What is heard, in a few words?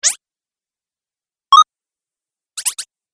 Videogame Fx; Sound Pack 3; Video Game Sound Effects